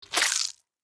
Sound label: Worm squashed organic impact; slug and worm